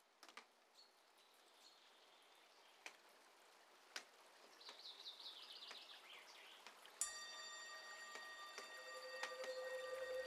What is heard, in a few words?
Rain in 432Hz